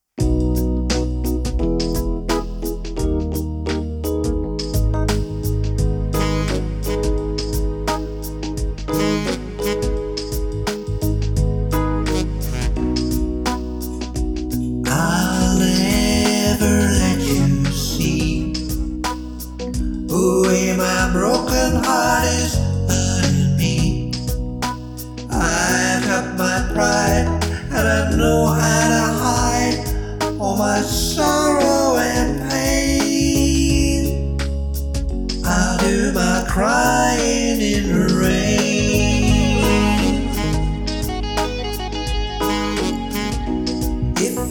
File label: Vocal